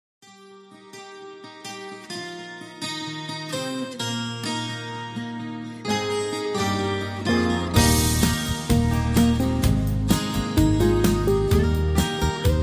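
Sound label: Instrumental with Melody and background vocals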